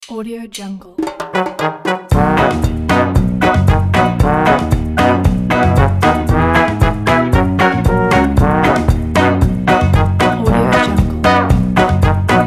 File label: reggae ska